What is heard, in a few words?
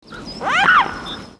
Cygnus olor